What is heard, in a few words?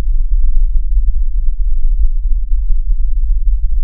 Wobble; Ableton Live 10 Suite